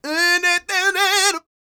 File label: SING, Vocal Planet R&B & Dance; ILIO